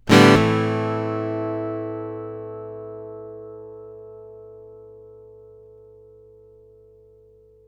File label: One Shot Guitar 125 BPM (A Minor), Acoustic Guitar; Instruments; One Shots; GhostHack; Ghosthack - Free Guitar And Piano Samples